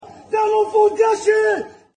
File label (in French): enfant gache court